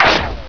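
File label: ricochet, weapons; Source Engine; bshift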